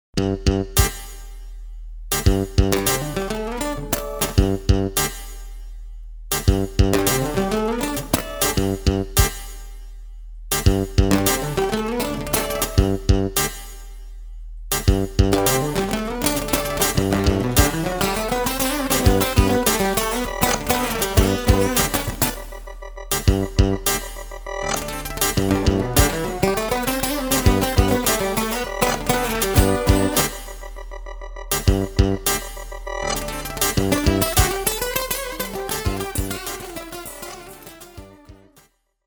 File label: Reverse (gtr samples)